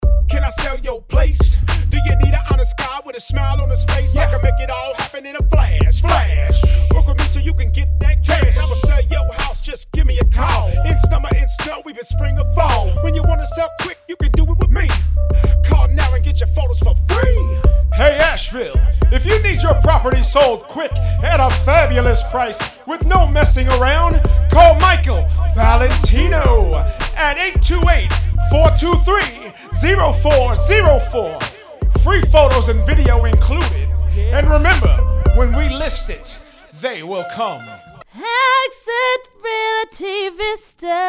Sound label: Jingle, Hip Hop; Carolina Listings